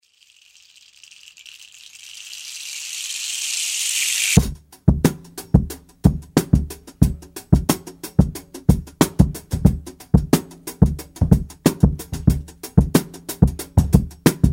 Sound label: African Rhythms